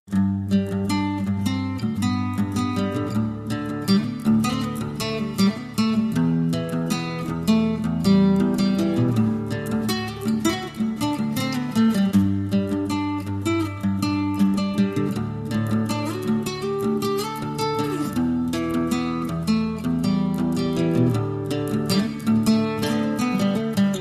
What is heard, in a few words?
Best Acoustic Guitar